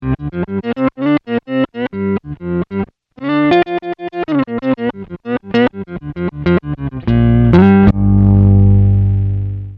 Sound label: Micro Synthesizer Slither